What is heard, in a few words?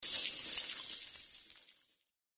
coins End; Champions of Valhalla